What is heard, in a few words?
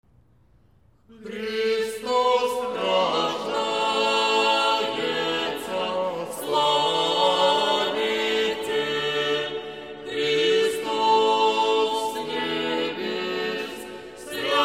Christ is born, Russian Spiritual Music